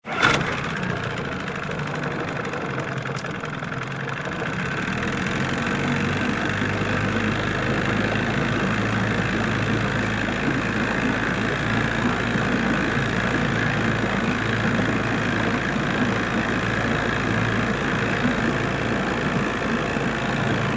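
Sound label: motor boat starts and stops; Моторная лодка, катер и яхта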